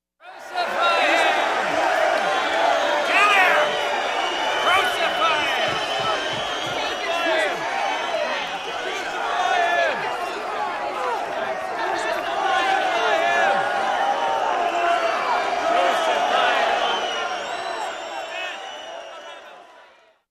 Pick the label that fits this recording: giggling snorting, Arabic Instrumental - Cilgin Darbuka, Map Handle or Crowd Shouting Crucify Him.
Crowd Shouting Crucify Him